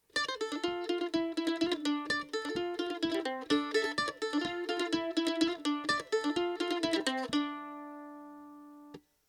mandolin comparison acoustic

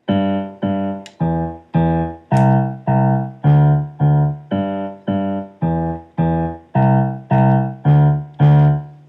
simple bass, simple piano recordings; maries wedding

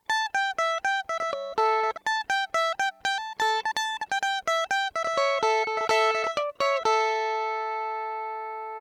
mandolin comparison electric tb

